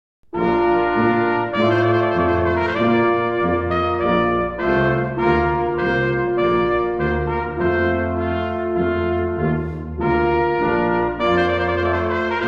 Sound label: Trumpet Voluntary